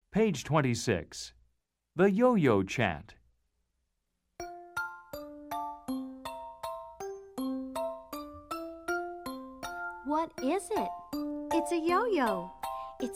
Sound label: Chant solo; logos english; Let's Chant, Let's Sing 1